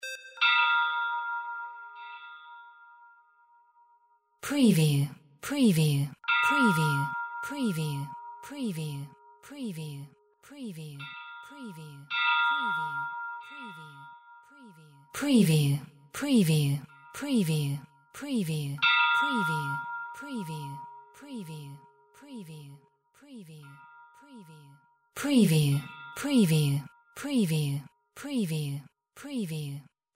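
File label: AMBIENCE BOUY NO SEA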